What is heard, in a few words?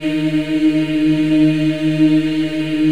D#3 MW IH, choir; USB Soundscan vol.28; Acoustic & Synth; AKAI